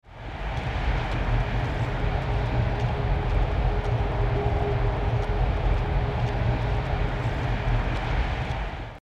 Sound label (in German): autobahn lärm